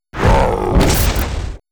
attack

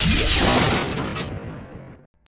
war die; HOBOCUTTER

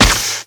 gun Scale, Damage

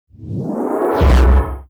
power up; Heller; Mob

